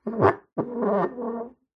household cup mug turn rotate scrape on wood table